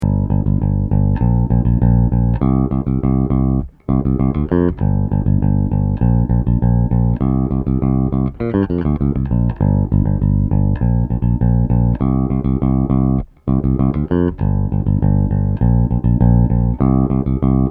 scrambler

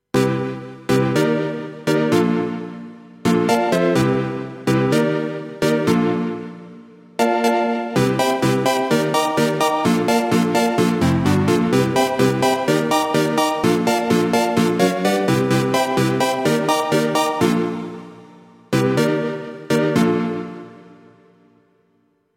TechChord

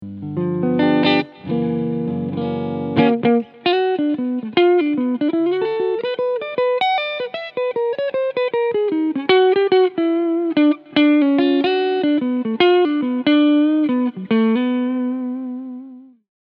Cleansound Front PU

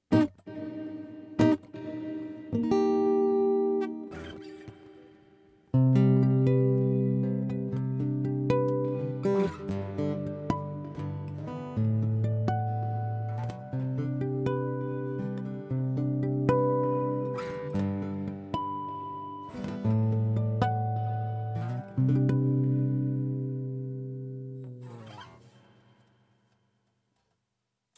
Delay Reverb; Mackie ProFX6v3

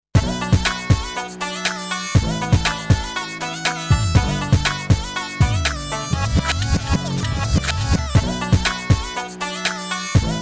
Beat Reverse

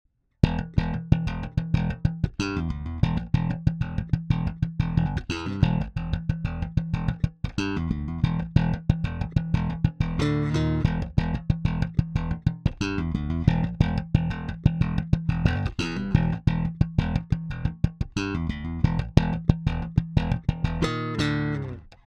Both Bass Treble Boost

